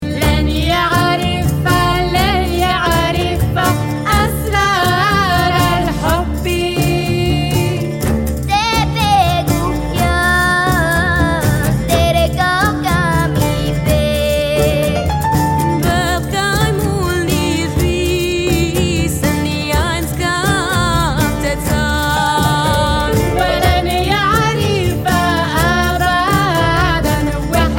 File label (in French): chant pour la paix